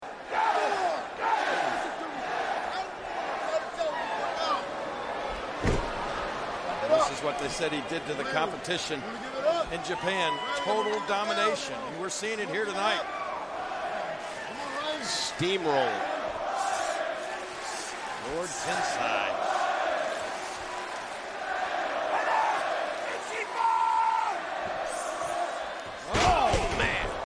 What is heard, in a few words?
chants; daniel bryan